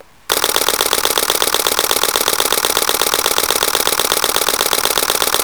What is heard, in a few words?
d700 mbd10 8fps; nikon d700 sb900